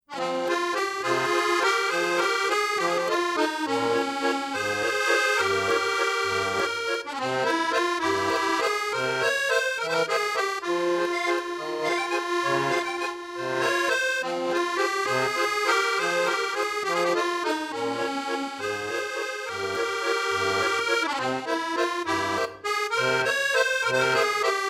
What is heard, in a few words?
Accordion, French Song